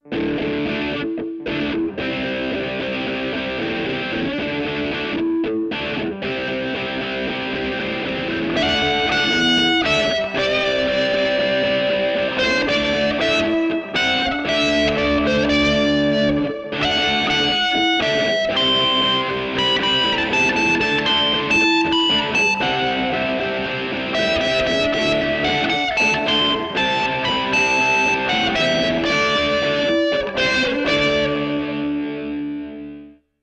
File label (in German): anfaenger e gitarre; musikschule; instrumentalunterricht